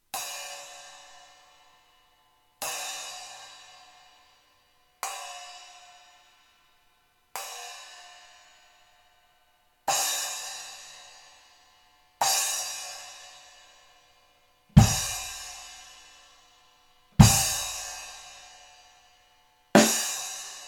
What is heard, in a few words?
Splash; Amedia; Ahmet Legend